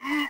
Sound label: hurt